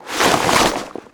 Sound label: Short foley